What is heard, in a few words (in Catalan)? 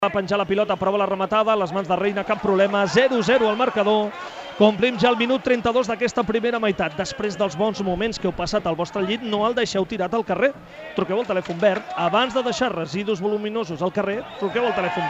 telefon verd